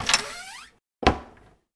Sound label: pub toilet door close; DeadFright